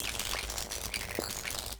arcing; dfcaverns; underworld items

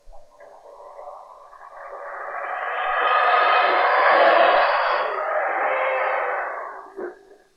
howler monkey; dfcaverns; ambience

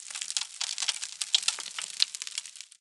spore tree pitter patter; trees; dfcaverns

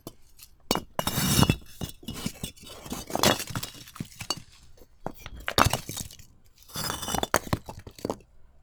turn grind; dfcaverns; hunter statue

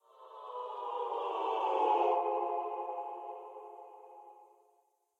people; server-nalc; death messages

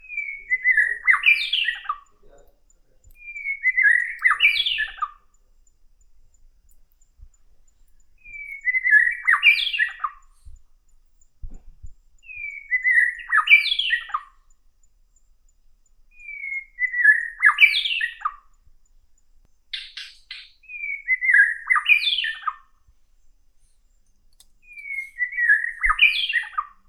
jungle bird; ambience; dfcaverns

